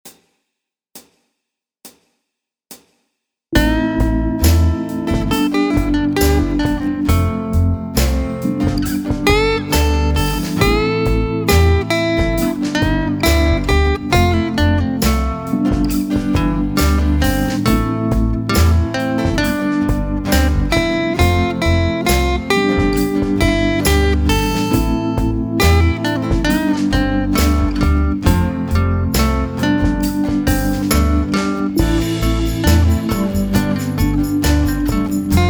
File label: Grand Finale Solo, Guitar